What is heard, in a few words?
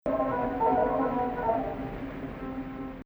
2nd Rast, maqam